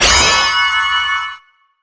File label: impact